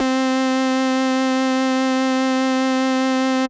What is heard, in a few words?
Synth C, Synth Prophet One Shots; Electronisounds